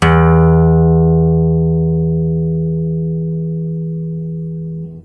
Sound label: string